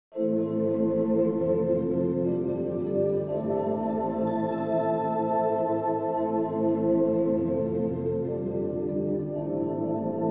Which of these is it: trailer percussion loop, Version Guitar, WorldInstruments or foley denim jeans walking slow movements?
WorldInstruments